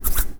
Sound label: hand mono